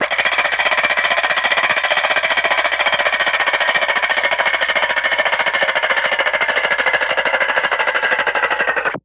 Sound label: Ratchet Slow Wind, ratchets